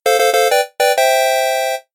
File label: Game Compl; Animal Up; switch windows